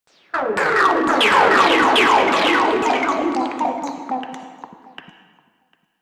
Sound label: techno bok bok